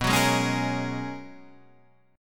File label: G sharp Minor 11th B, guitar chord